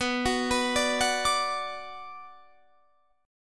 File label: D 6th B, chord